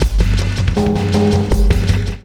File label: Morph Bumpers, Fx; Sonic Foundry (Sony Creative Software); Esoterik Beatz